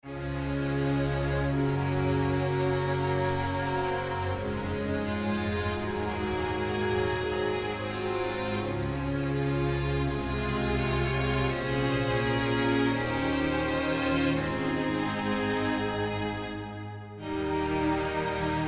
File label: Grieg Piano Concerto in A Op 16 2 Adagio